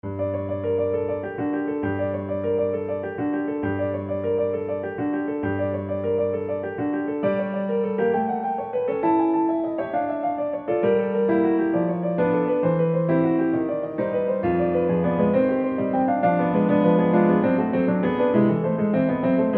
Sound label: tarantella